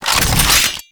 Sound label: Full Equipment Pick Up; Warframe; Mod Quest; Teshin's Hideout; Teshins Dojo